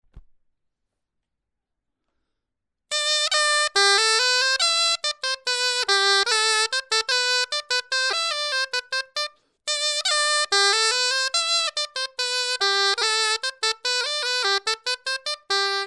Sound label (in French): clarin etude